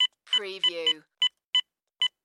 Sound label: Telephone Cordless